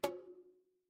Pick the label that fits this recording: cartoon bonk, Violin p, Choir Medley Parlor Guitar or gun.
cartoon bonk